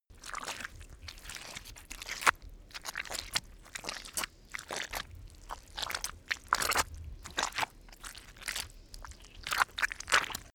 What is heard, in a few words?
step mud walk; schritte